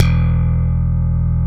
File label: Slap Bass E0; Proteus 2000; Digital Sound Factory Proteus 2000